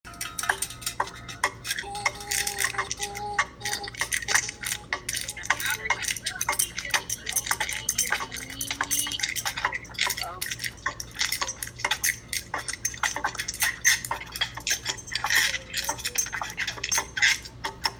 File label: scream from the top of your lungs; covid